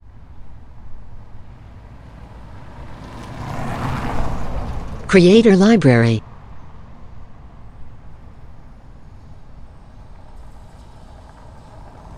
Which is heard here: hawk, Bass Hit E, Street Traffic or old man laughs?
Street Traffic